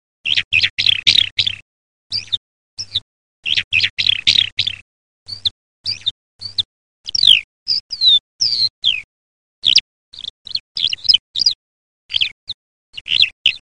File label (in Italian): allodola